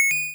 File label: coin, square waveform; Square Waveform sfx